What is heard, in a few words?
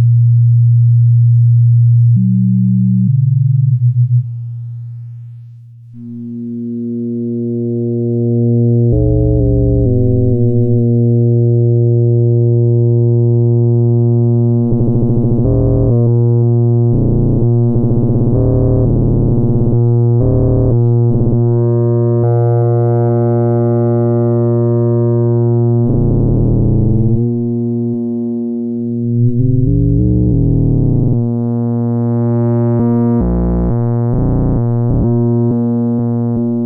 cyclebox sine sine varying ifm and ph2